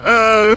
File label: agh; paragus